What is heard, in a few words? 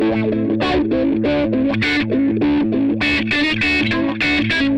fonky rhythm, Psychedelic Guitar; Sonic Foundry